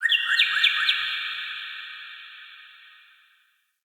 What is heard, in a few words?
Cardinal Male React Positive; Objects; Thawtide